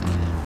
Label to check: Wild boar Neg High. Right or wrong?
Right